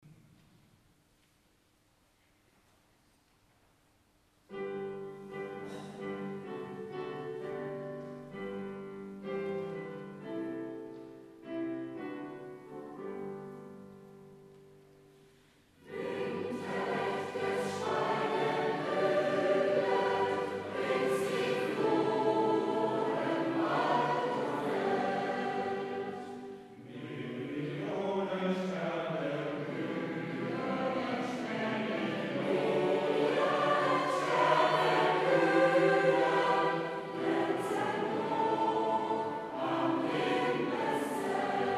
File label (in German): Weihnachtsglocken; 2015 adventskonzert